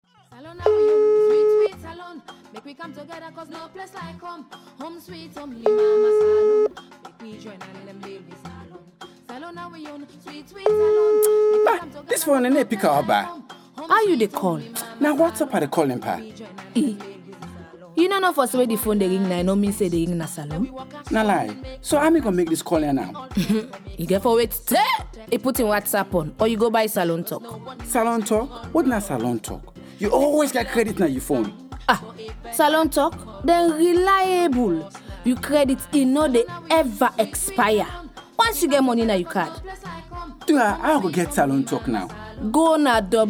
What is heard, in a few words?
Jingle; Salone Talk